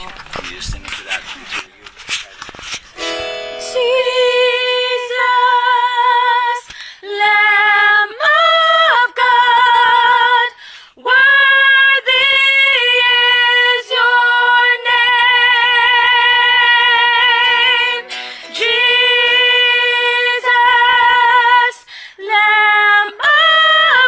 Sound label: all soprano